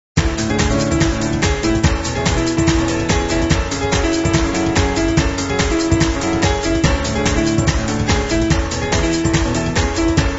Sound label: piano